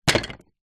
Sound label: leisure toy train wooden track piece place down on others in plastic box; zapsplat